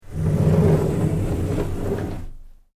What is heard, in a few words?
Door Wooden Old Sliding Open; Various doors; Gate Sound Effects; Gates (Sound Effects:Doors)